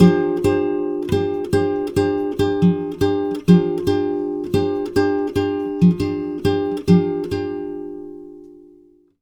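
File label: 140 guitar F7, Brazil Bossa; USB Soundscan; AKAI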